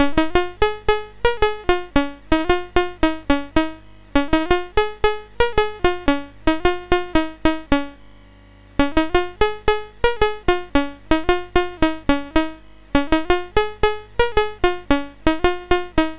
BASIC sid MOS6581R4; Oh Suzannah

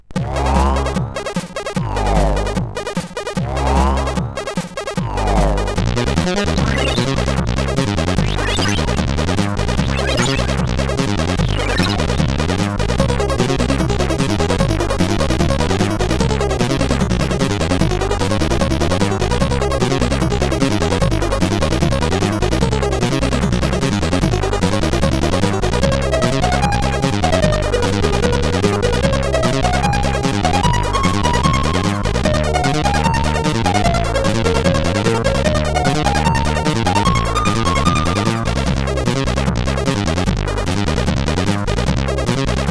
sid CSG8580R5

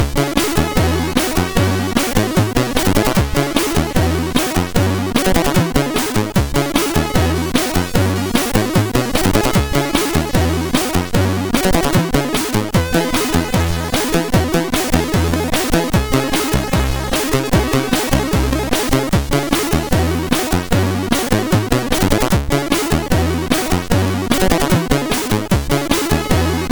Menutune, SID MOS6581R3; new player test

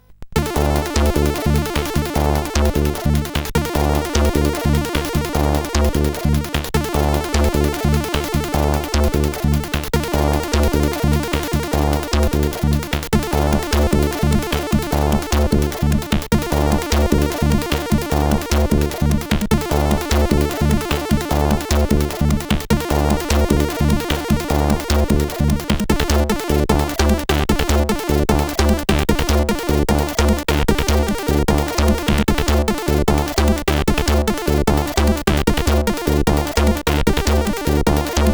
Somethin Like Techno sid CSG8580R5

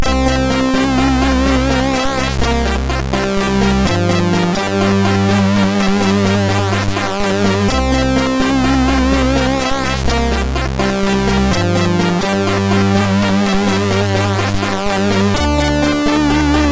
Old Music, MOS6581R2

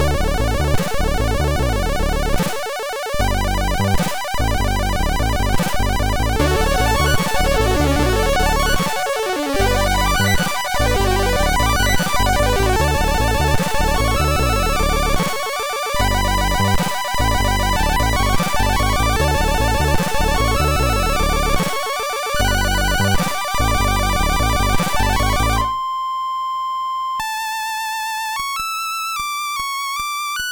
Lame Tune, sid MOS6581R2

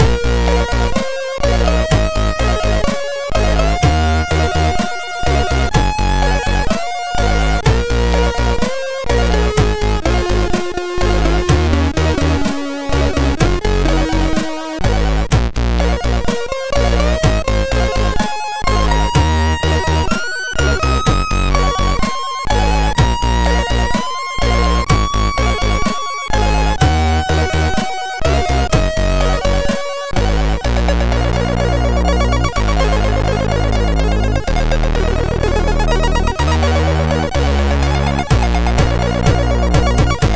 Rythm Crush, MOS6581R2

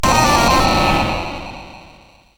Tecnetium, CSG8580R5

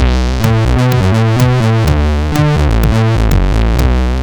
Simple Bassline, sid; CSG8580R5

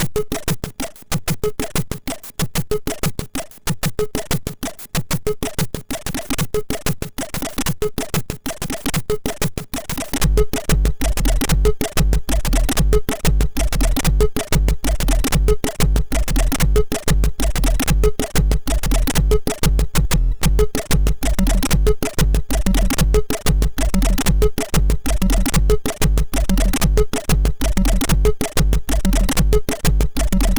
Jungle Beat, sid, MOS6581R4

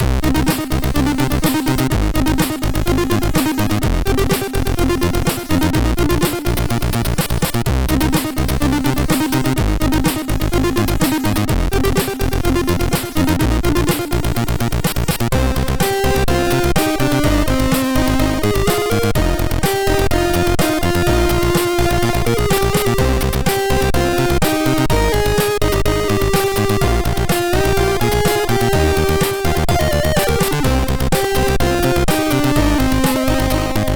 Crack Outro Tune, MOS6581R3

